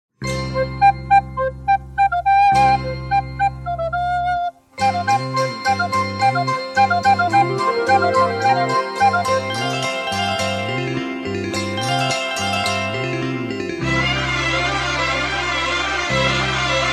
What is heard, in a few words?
Flute Guitar